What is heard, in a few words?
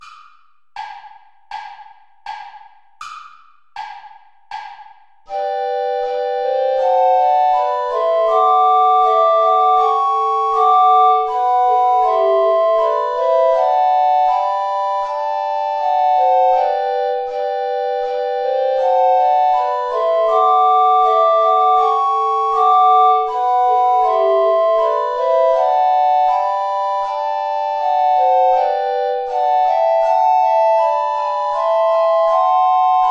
play along without bass recorder